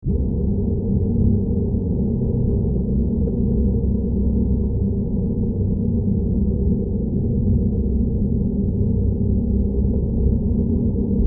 Ambiance Tonal Bass, drones & tones

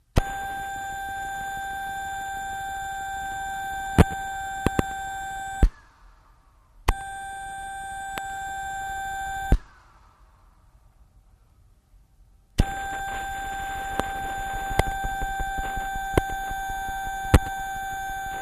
Futz Radio: Field Radio Wind-, Charge-up

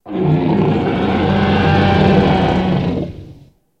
Dinosaur Roar Deep Ln

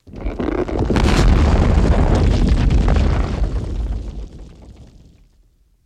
Earthquake Rock Debr

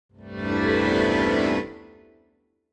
Accordion Bass Keys; keyboards

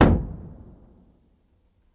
Staff Hit Single, spears & staffs

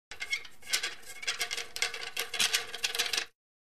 Billiards Unscrewi

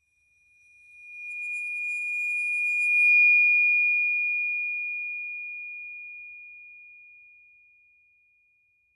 Tibetan Chime Bow, chimes

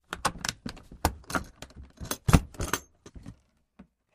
Opened, trunks

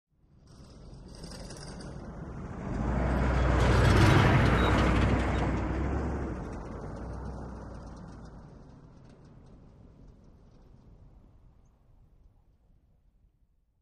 Humvee Medium Speed B, humvee bys

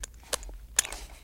Bottle Remove Cap

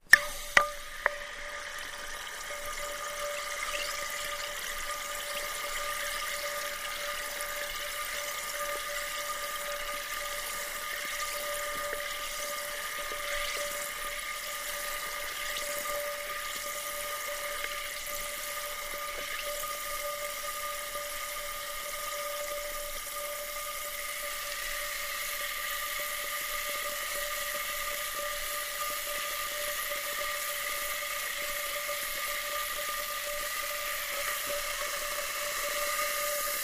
Ambiance Fizz Swirl, bubbles

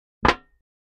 Aluminum Pan Single; barrels buckets & cans

